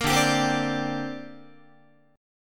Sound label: D 7th Suspended 4th Sharp 5th down Guitar; D7sus4#5; strummed